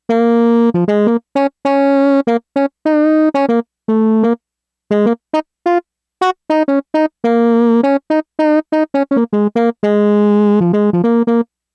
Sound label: Sax, Yamaha DX11; Factory Preset Voices